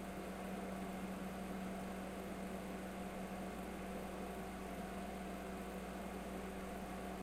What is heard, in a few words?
Fridge; Game rip; MiSide